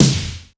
Korg 01W MegaDrums; 300 Drum Machines

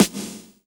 SD DANCE; Sound & Vision - Gigapack I

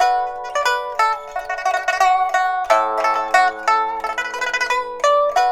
PIPA, 90; INIS - Opium; PIPA 90-D

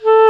WND CLAR, Clarinet Sh

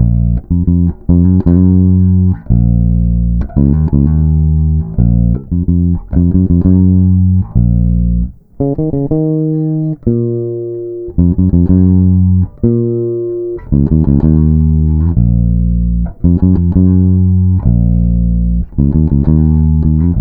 Bass, Electro Lounge; Sonic Foundry (Sony Creative Software); 095 Get It Done

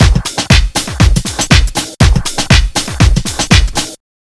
house loop

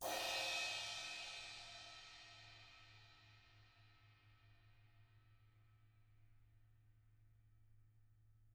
FR R, Drums, BRUSHR

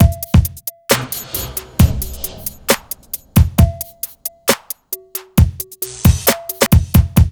BEAT, Hip Hop R n B; USB Soundscan vol.19; AKAI

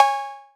Old Skool House Perc

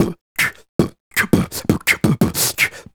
Beat Box, Vocal; Sonic Foundry (Sony Creative Software); Vocal Toolbox

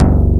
ATTACK BASS

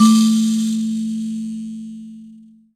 MBIRA A3; E-MU Producer Series Vol. 2; More Studio Essentials; Composer

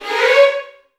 VN FX, Virtuoso Strings

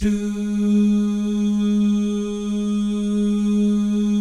DOO GUYS, Vocal Jazz FX; Spectrasonics; Vocal Planet; JAZZCOMBO

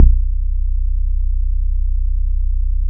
BASS, Bass Analog & Synth; DADDZ; USB Soundscan vol.12; AKAI

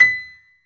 PF, B GRAND PF

